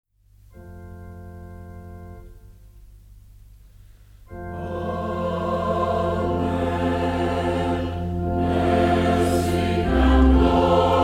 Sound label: Choir, hymns